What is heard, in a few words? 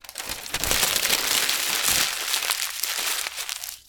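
crumpled news paper; 新聞紙丸める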